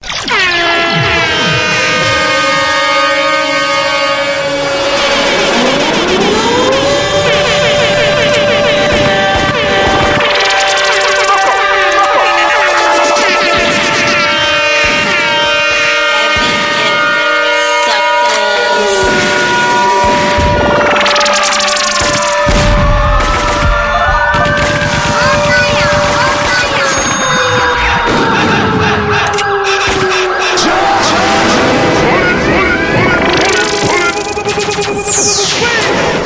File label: DJ Sound Effects